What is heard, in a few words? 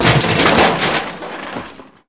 trash; KINGPIN